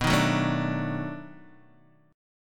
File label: B 7th Sharp 9th B down Guitar Open D